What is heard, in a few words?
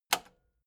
metal button spring click slow